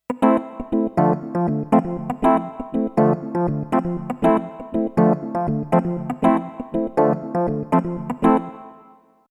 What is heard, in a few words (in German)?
Delay Melodie